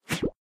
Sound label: Ui Change; Doodle Jump 2; Android